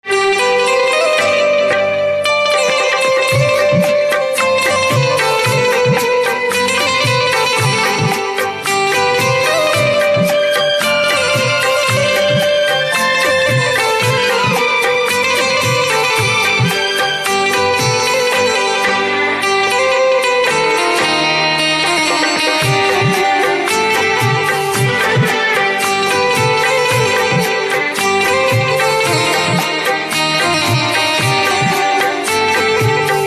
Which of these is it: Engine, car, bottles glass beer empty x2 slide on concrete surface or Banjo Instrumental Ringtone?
Banjo Instrumental Ringtone